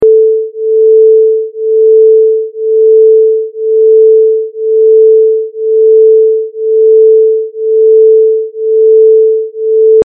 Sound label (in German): schwebung 440 441Hz